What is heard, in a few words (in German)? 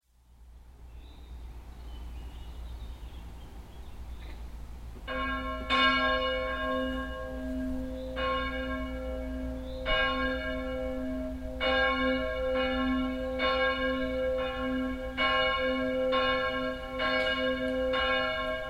Plenum, glocken; Nideggen